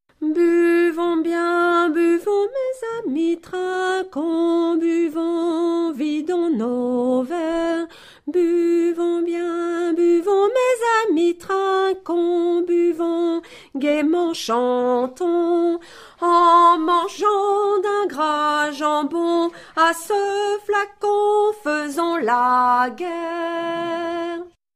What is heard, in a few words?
Tourdion, Basse